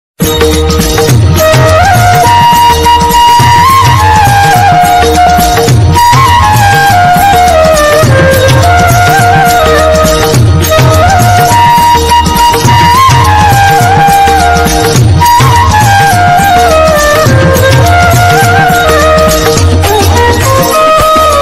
hanuman chalisa instrumental ringtone; hanuman ji; bajrang bali

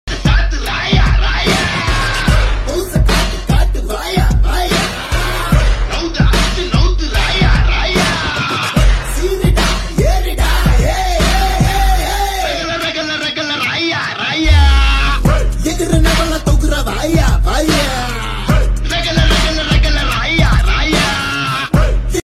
bgm ringtone mass bgm; raayan; dhanush

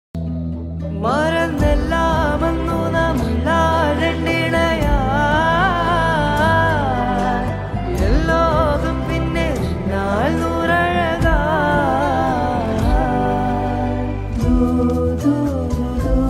song

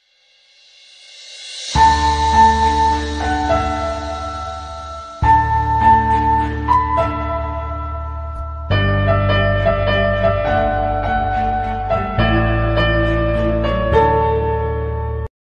sad status bgm ringtone broken; kabir singh

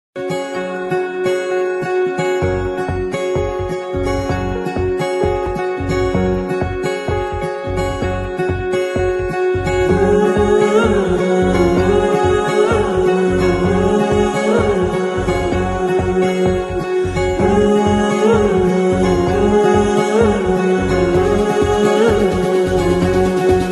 bgm tamil

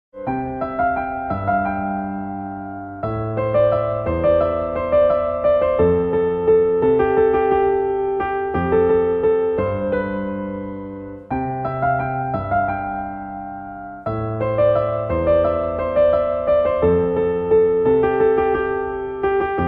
piano ringtone

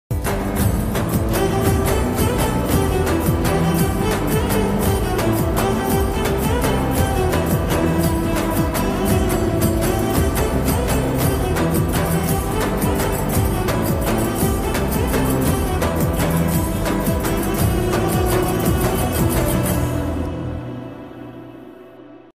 love bgm ringtone romantic ringtone; chal mohan ranga

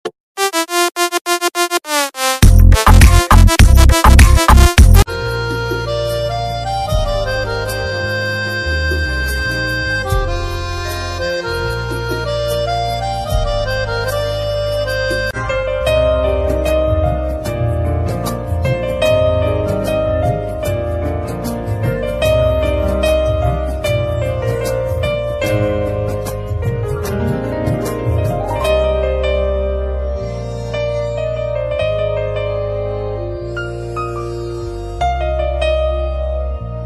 instrumental music ringtone

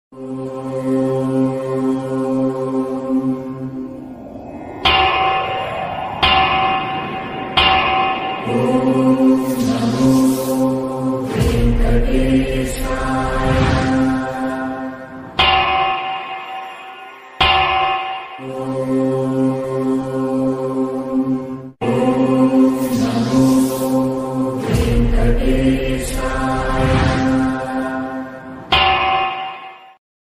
telugu songs; sri venkateswara swamy

